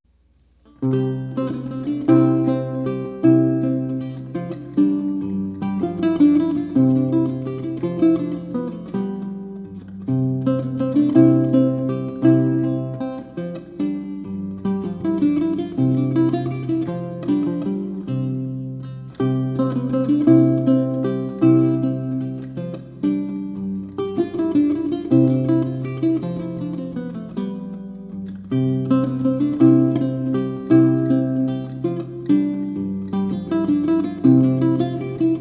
Basse dance, Lute, Renaissance France; La Roque; Pierre Attaingnant

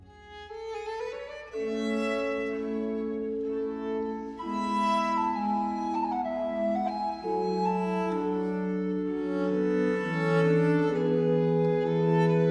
Quadro in G minor Adagio; Telemann

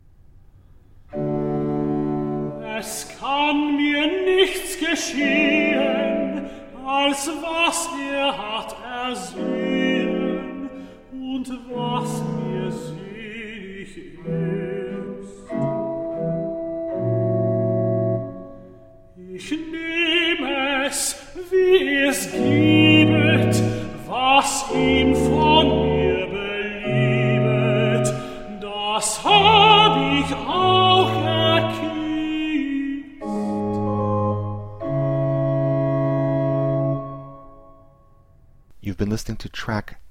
Recitativo Cantata; In allen meinen Taten BWV 97; Johann Sebastian Bach

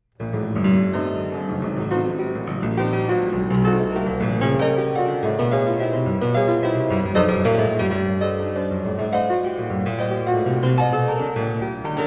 Preludes Op 28 - No 22 in g minor spoken, Piano; Frederic Chopin

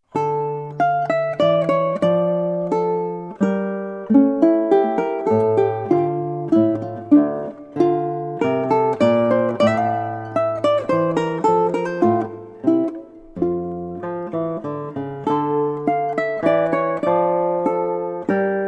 Menuet, Guitar and Ukulele; BWV Anhang 132; JS Bach; Johann Sebastian Bach

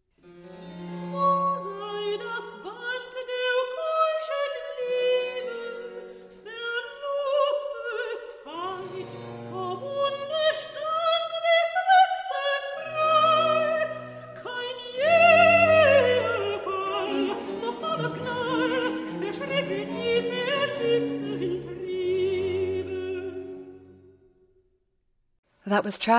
Recitative; Weichet nur betrubte Schatten; Bach; Cantate

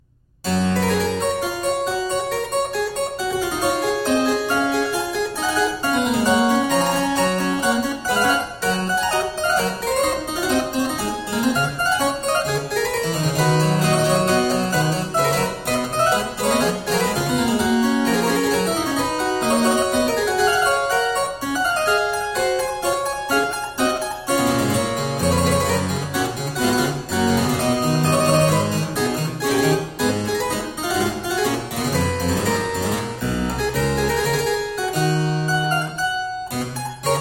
Cembalo Solo- Preludio, Harpsichord; Georg Philip Telemann; Der Getreue Music Meister

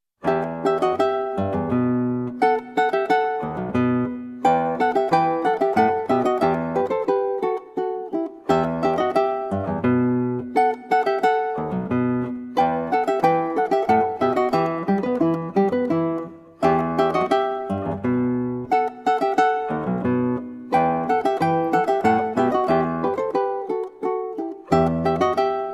Polonaise, Guitar and Ukulele; BWV Anhang 123; Johann Sebastian Bach

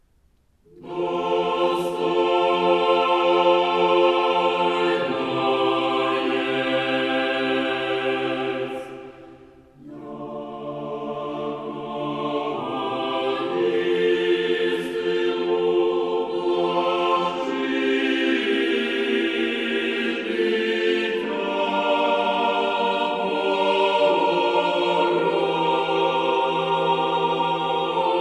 Chamber Choir; It is meet; Kyiv Chamber Choir; musica corale barocca ucraina

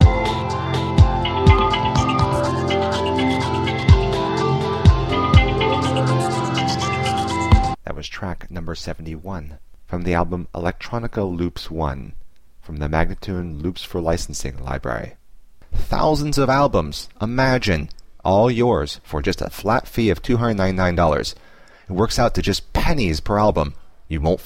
124 F spoken; Electronica Loops 1

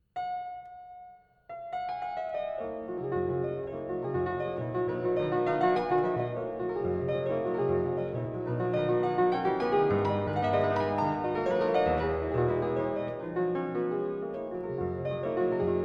Preludes Op 28 No 11 in b major, Piano; Chopin Preludes; Frederic Chopin

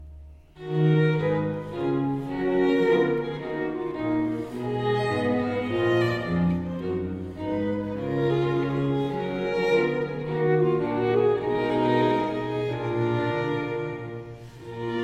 Cantata - Minuet; Il Delirio Amoroso; George Frideric Handel

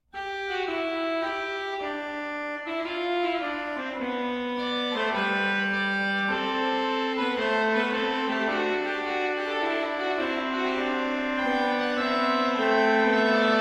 Kyrie Fugue sur la Trompette; Francois Couperin; Messe a l'usage des Couvents

